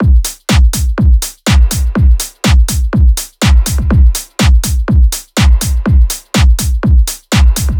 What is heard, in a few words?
drums Santorini 123, Full Drum Loops; drum sample pack; SEISMIC; Slate